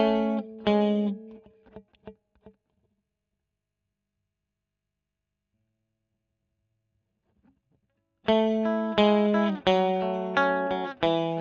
E. GTR Royer

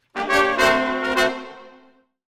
Brass, Jazz for piano

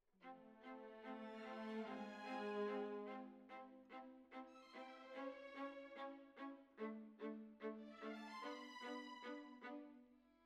Violas Over

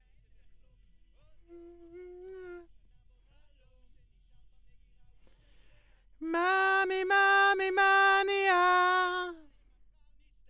B. Vox

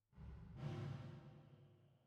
Rev

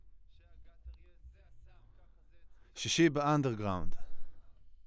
Voc OD

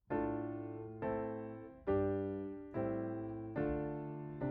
Piano A

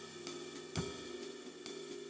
Ride

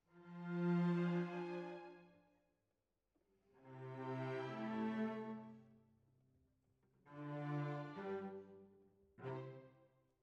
Cellos

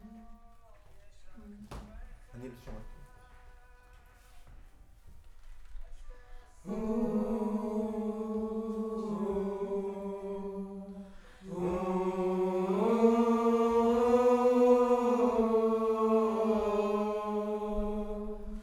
Choir Far

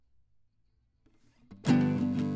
Classic Guitar

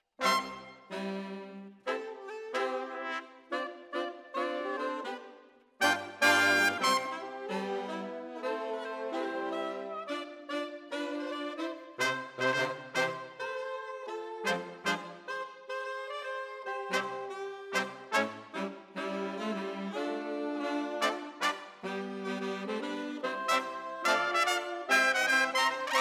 Brass Over

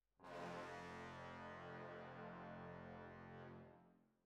Horns

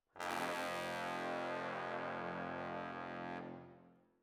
ORTF, Jazz for piano

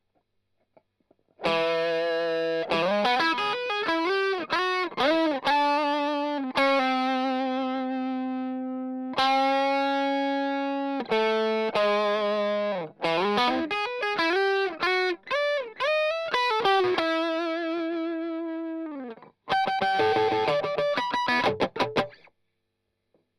Strum Line

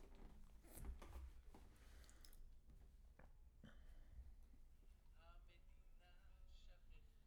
Bass

